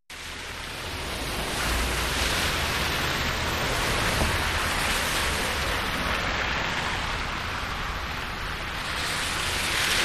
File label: Traffic Close Car Bys, wet traffic